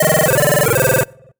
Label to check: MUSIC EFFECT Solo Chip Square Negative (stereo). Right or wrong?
Right